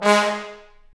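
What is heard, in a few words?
trombone; Roland L-CDC-01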